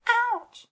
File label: Hurt; Gameplay